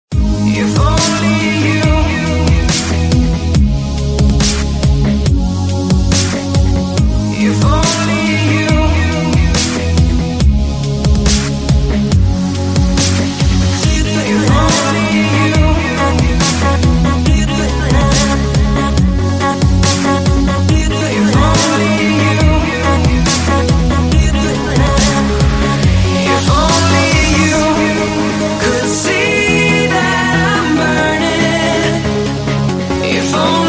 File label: dubstep remix